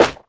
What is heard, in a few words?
book flick; susato